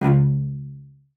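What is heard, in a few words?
CELLO spiccato C#2; Xsample VOL-5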